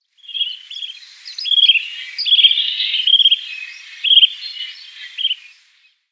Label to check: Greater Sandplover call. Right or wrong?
Right